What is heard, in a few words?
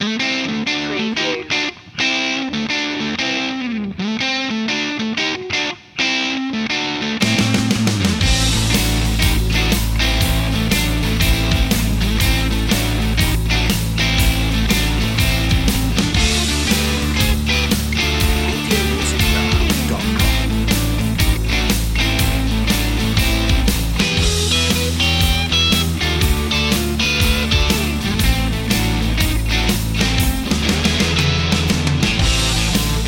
blues